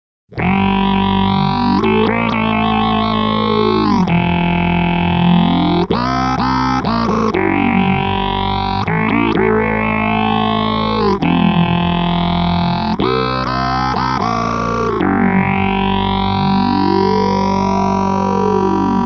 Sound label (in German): Subdecay Pixelwave Gitarre mit Bass Preset